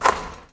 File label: swish, sports; 1000fx